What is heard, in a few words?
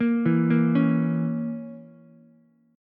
Bb Diminished Bb down Ukulele; arpeggiated; chord shape